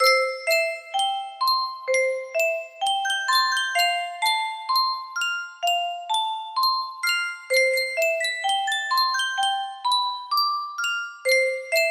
Yunsheng music box

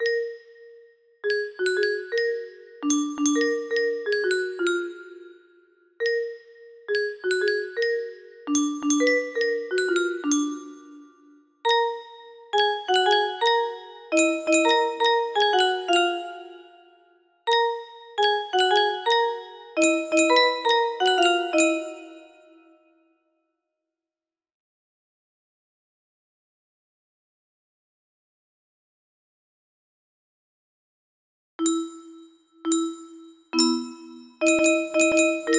music box cover, music box melody; die house; cuphead

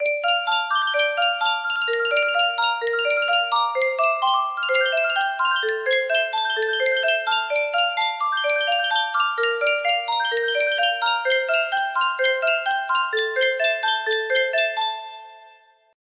yunsheng music box, melody

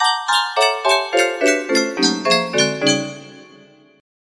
breaking music box

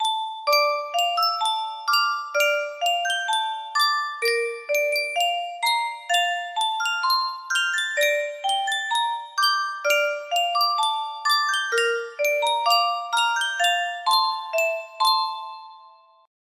music box melody

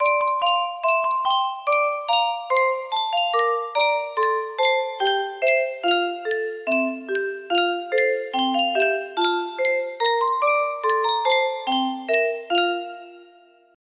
yunsheng music box

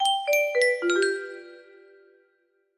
music box melody

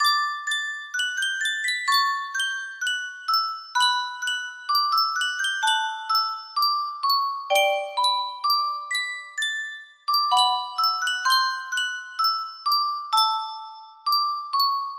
Yunsheng Custom Tune Music Box, music box melody

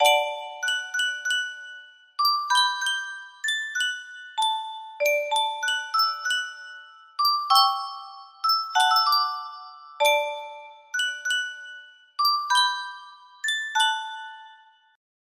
Yunsheng Music Box, music box melody

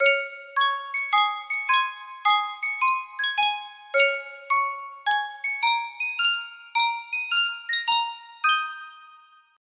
Sankyo miniature music box; schubert symphony no 8

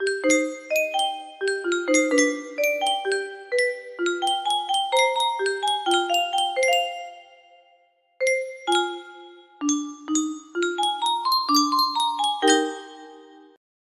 Yunsheng custom tune music box

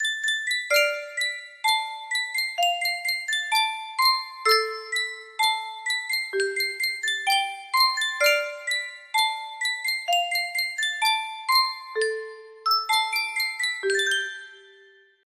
sankyo music box, melody

